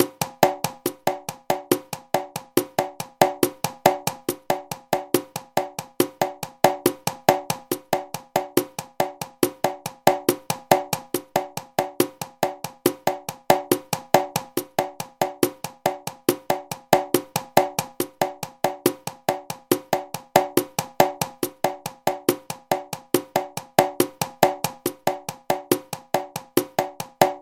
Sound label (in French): songo bota conga lent